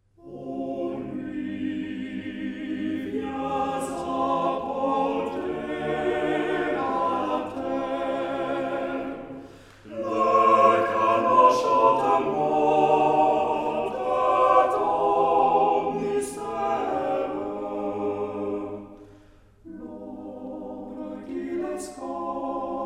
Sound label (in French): chant noël la nuit